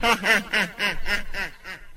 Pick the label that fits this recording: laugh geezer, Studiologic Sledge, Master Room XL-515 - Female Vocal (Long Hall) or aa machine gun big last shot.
laugh geezer